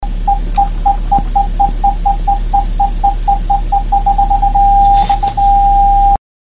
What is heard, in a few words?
backup car